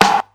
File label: deep steel snare drum sound g key; snare drum samples